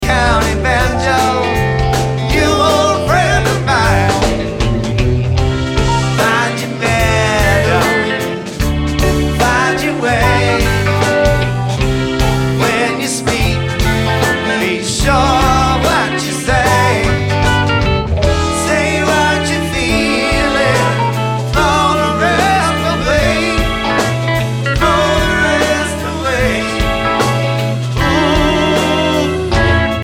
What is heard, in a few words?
Banjo